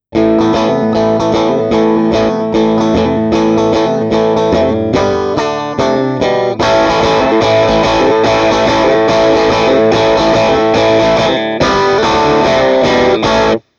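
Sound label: Off On, guitar, Treble Booster; Guild 1984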